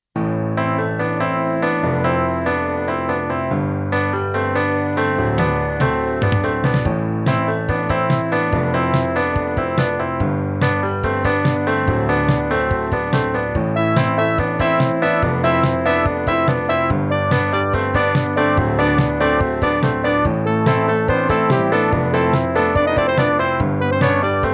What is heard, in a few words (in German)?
zwischenmusik